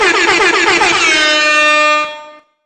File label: Airhorn; Five Nights at Freddy's; Ultimate Custom Night